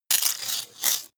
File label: sci-fi electromechanics tiny voltage spark